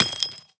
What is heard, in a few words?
Shell heavy dirt; STALKER; IWP